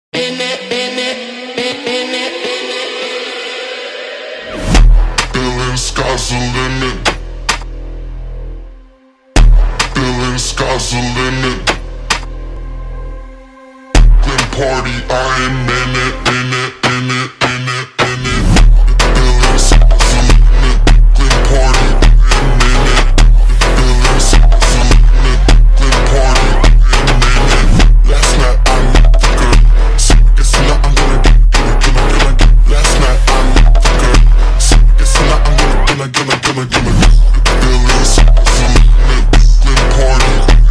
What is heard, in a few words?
Brazilian phonk super slowed remix